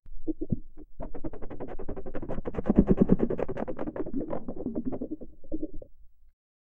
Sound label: many soft tentacles wrigg